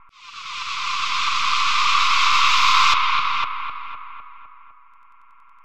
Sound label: birds attack, Drum N Bass; Sonic Foundry (Sony Creative Software); Knutrix Drum & Bass